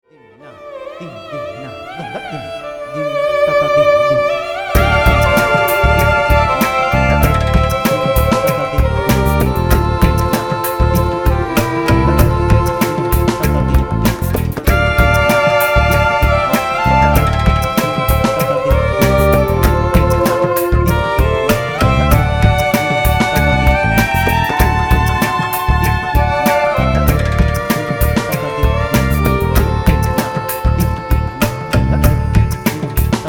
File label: Drum